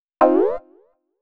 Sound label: 125 Clavy C, Keys, Nu Jazz House; PowerFX - Nu Jazz House